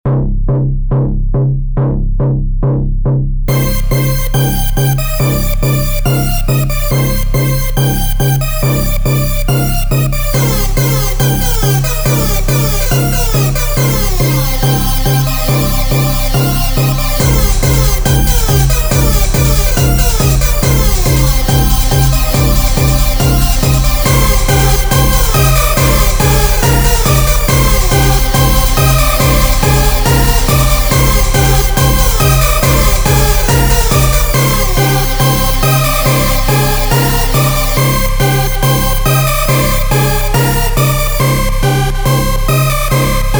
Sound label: Hardstyle